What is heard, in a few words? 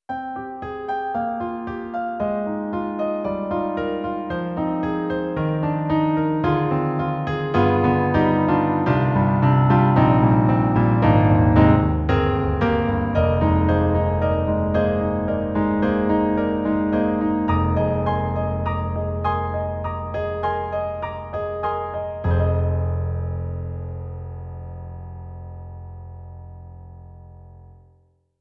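European Piano; Roland; SonicCell; GM2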